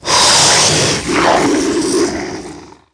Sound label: nehebkau die; DAoC; Toa; Gamestar